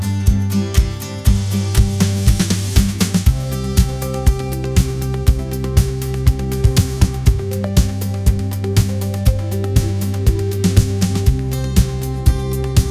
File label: sunlit hearts instrumental, ringtones